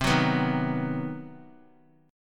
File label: C Minor Major 7th Flat 5th chord, Guitar; strummed; JGuitar